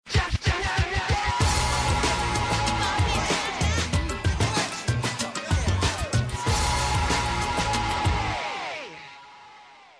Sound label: cool shield, electronic ringtones